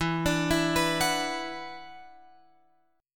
E 7th Suspended 2nd E down Guitar Open F; E7sus2; arpeggiated

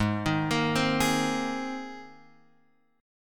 Ab Major Add 9th Ab, Guitar Open F; arpeggiated; x,3,3,5,3,3

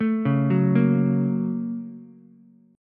Bb Minor Major 7th Sharp 5th Bb down Ukulele; BbmM7#5; arpeggiated; JGuitar

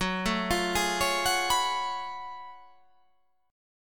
F sharp Minor Major 11th; chordshape; down

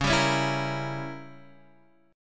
C sharp Diminished 7th, C sharp, chord; strummed; x,4,5,6,5,6; Standard; JGuitar

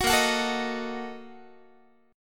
Bb-Major 7th Suspended 4th Sharp 5th, Guitar; strummed

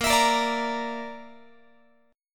A sharp Major Flat 5th, Guitar; A#Mb5; strummed; x,18,19,20,18,21

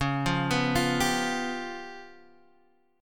C sharp 7th Flat 5th; arpeggiated; chord; 9,8,9,10,8,x

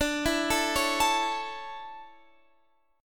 D Major 7th Suspended 2nd Guitar Standard, chord; arpeggiated

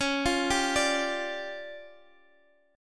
D Minor Major 7th Double Flat 5th C sharp, guitar

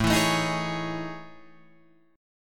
A Major Sharp 11th

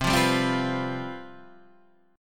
C Major 7th Suspended 4th, down

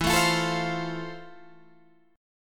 F Major, guitar chord

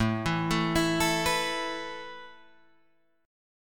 A Suspended 2nd A Guitar Drop D

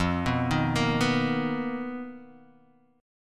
B Major 7th Flat 5th F, chord shape

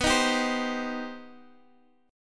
C Minor B; strummed; Cm/B

